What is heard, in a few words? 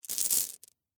Marble Stones Down, Texture, Marble, Stones, Set, Down, Handful; Miscellaneous Toy Sound Effects